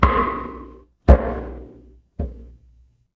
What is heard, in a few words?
instrument wood block